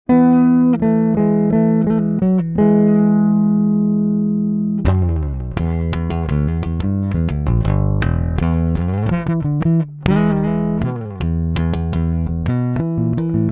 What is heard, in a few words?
Neck Passive